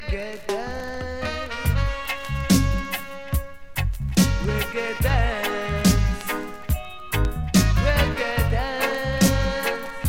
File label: Reggae Dance